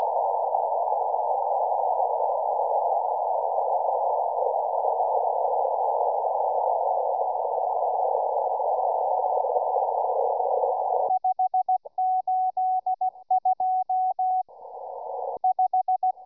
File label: morse